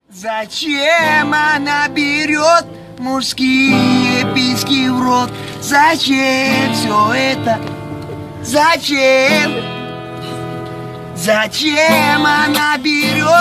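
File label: cover guitar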